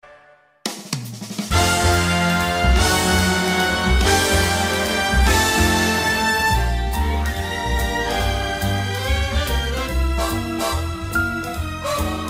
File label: Radio Big Band, Radio style ads intros sweeps & jingles